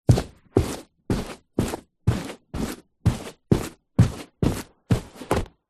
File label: footsteps shoes softly slight tip toe carpet walking; foley; zapsplat